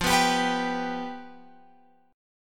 F Suspended 2nd Flat 5th, Guitar, Open F; strummed; x,12,11,14,14,14; JGuitar

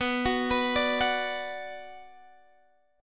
B Minor B Guitar Drop D

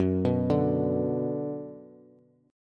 Gb Suspended 4th Sharp 5th Gb, down, Bass; arpeggiated; JGuitar

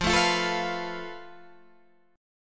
F Major Flat 5th

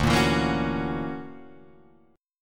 D Augmented Major 9th down Guitar DADGAD

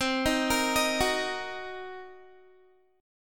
C Minor 7th Flat 5th, C, Guitar; arpeggiated; Cm7b5; 20,18,20,20,18,x; 4E,4A,5D,5G,5C,6F

